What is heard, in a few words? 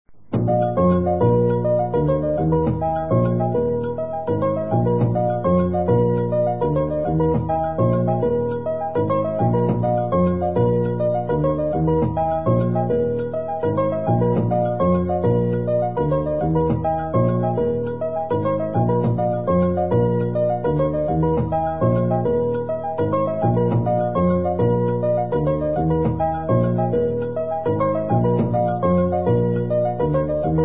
Merengue, Piano; Piano diary 2000